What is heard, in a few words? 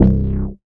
DM BAS, Dance Mega Synth